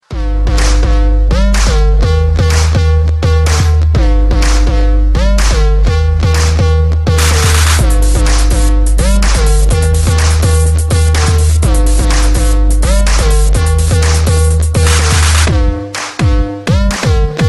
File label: Instrumental